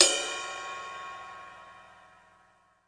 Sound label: pearlkit ride bell, Pearl drum kit; REAL LIVE KITS